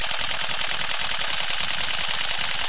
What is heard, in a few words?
Canon Camera Shutter